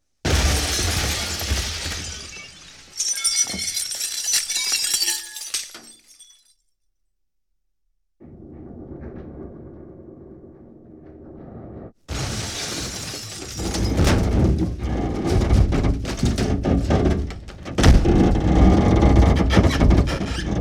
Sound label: Explosion, 5.1 Surround